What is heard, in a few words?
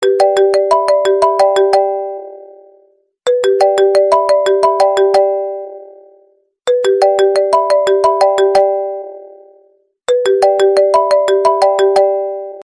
Call; iPhone 13